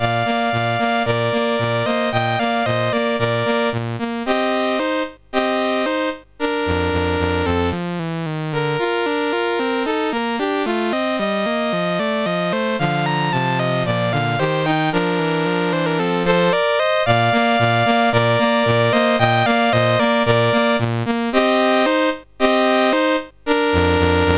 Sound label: Concerto for Clarinet, CSG8580R5; Dave Marquis